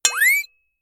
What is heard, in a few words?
notification; samsung Aleart